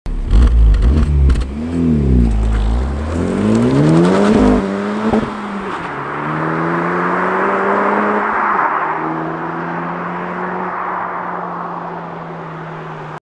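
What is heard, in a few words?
Honda Civic, exhaust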